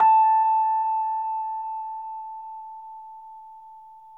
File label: Rhodes 73 A; E-MU Producer Series Vol. 5 – 3-D Audio Collection; 3DRhodes